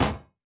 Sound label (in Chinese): 沉重核心 破坏声